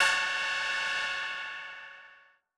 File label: M Dynamic Tech F#4; KG MegaSaur